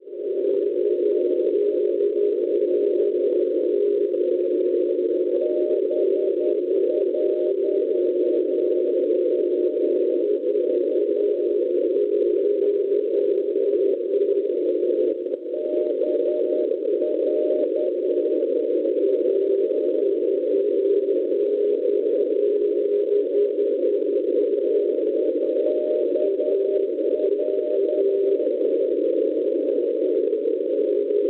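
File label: non-directional beacon 387 kHz; AV; Asturias; Spagna; Schwarzsee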